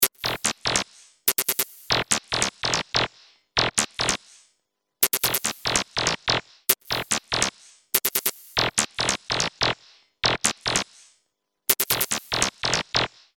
Low Zap; Industrial

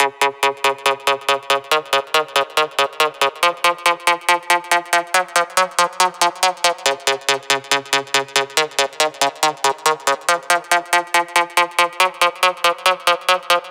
140BPM C#; Vengeance - Essential Clubsounds Vol.4

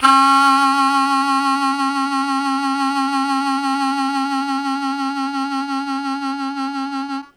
Strong Vib Sustain C#2, Harmonica; Zero G

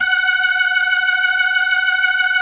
F#5F, B3, Vintage Keyboards

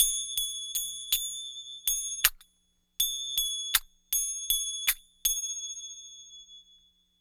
Finger Bell Rhythm, East Indian; Sonic Foundry (Sony Creative Software); Continental Drift Aftershocks

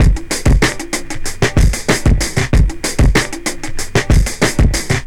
Drum Loop, Drum Loops; Sonic Foundry (Sony Creative Software); Leo Cavallo Bass Taster

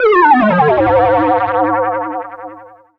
Dance Floor Bass, Multisample Basses

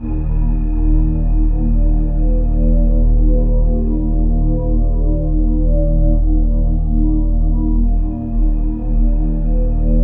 MENACE, DRONES; Spectrasonic Distorted Reality 2; DRONES 5

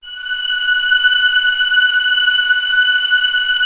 Orchestral f Slow, String Sections; Roland LCDP13

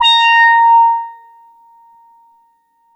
A#6, Boom da Bass; E-MU Producer Series Vol. 6 – Analogia Project (CD 1)

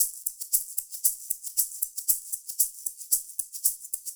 Disco Shakers, 70s Analog Funk; Sonic Foundry (Sony Creative Software); What it Is! 70s Analog Funk

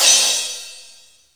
CR 78 KIT

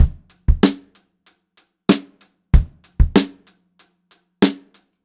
Funk-Groove Drumloops; USB Soundscan vol.38; AKAI

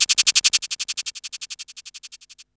HH FX, 85, Hip Hop R n B; USB Soundscan vol.19; AKAI; TIMBA

